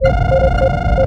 helm beep sequence, computer

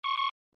alert, computer; Alert 12

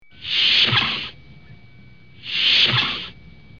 swoosh; TOS

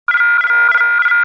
damage alarm, computer

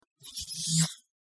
unstable holoprogram activates

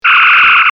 phaser

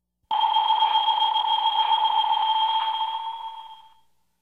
viewscreen magnification

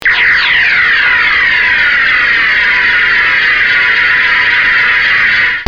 phaser clean

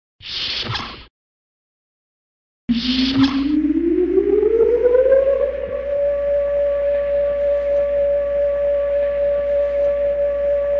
turbolift

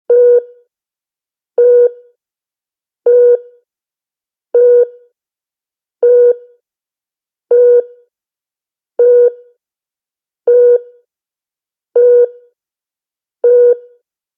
deflector alert

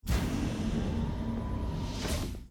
door; delta flyer

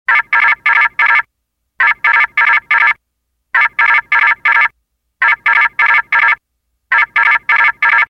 klingon sensor alert

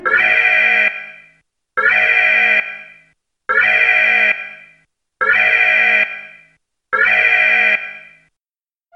intruder alert; TNG; nemesis

